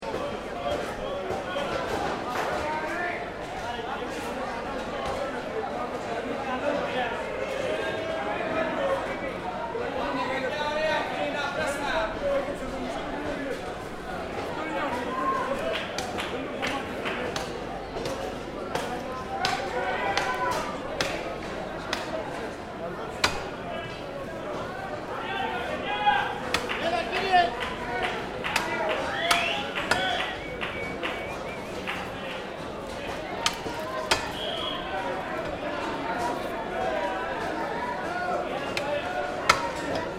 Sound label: Meat Market Crowd Athens Greece, Dense crowd of people; chatting and shouting; Meat being hacked and cut with machete; Crowd Sound Effects